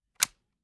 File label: ar selector on; gmod; viper